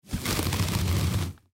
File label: Foam Grab Bend Art Craft; Miscellaneous Office Sound Effects; FOLEY